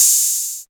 dark open hat sound g sharp key; open hat samples

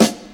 rich low end snare drum sound a sharp key

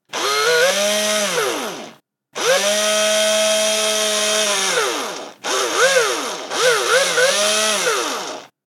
electric screwdriver

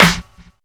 sizzle snare sample f key; snare drum samples

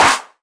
hand clap c key; percussion; single hits one shots

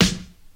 trebly snare b key

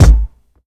present kick sample g sharp key

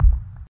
dark techno kick uk style blop; kick drum samples

